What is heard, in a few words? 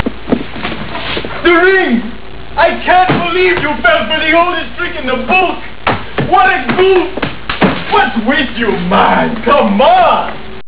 ring; space